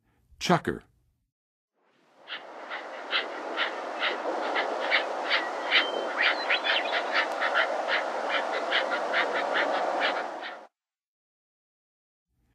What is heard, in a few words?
Chukar, Birds of North America; Western USA; Western USA Songbirds